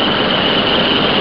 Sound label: heli